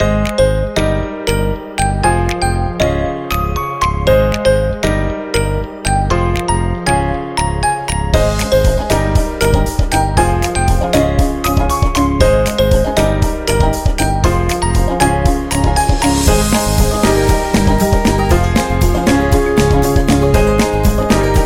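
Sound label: Child Fun, Happy and cheerful background music for celebration videos, birthdays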